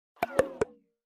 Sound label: Low Battery; کم شدن باتری گوشی